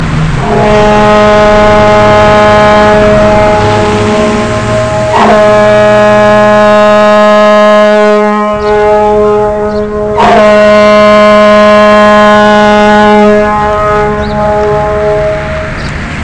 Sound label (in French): signal d alerte rupture de barrage; signaux d alerte